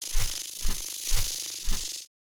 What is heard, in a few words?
butterfly pollution move